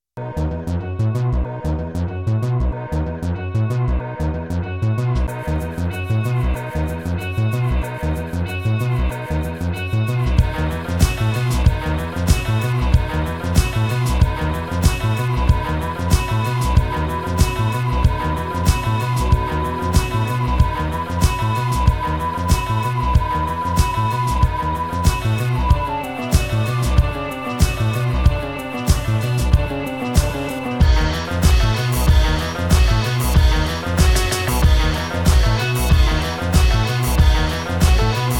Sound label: Lonely Guns Instrumental